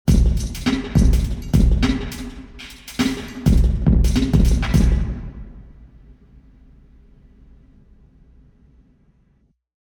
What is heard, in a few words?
tennis court drum; falkland palace royal tennis court; omni